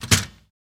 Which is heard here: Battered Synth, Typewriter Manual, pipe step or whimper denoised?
Typewriter Manual